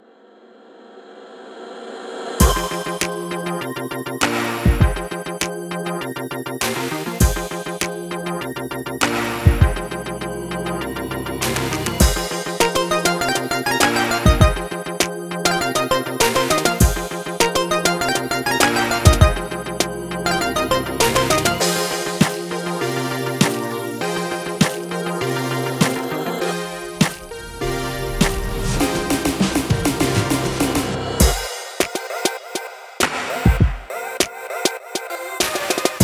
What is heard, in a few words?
rnb hip-hop; By Burak Sound Bank; r b hip hop rap tema paketleri vol 1